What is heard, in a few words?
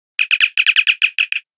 Chirp; TOS